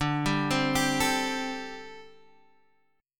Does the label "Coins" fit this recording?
No